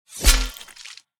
Sword Hit Flesh; Tyrael

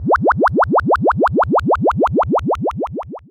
Cartoon Drowning

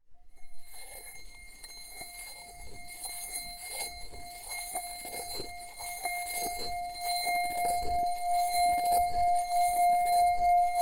Tibetan Singing Bowl

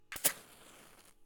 Lighting of match

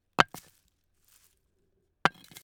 Throwing Brick

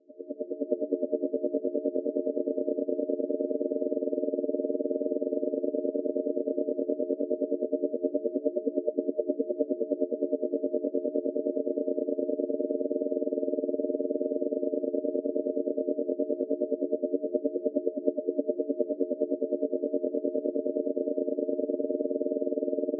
Space Investigation Atmosphere

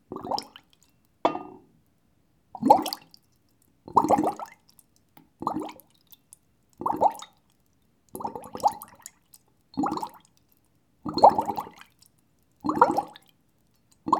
Short Bubbles

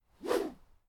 Bamboo Swing

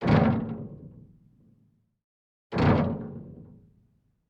Foley Impact Metal Movement

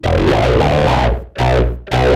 Dynabass

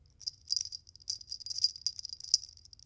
Jingle Bell Small

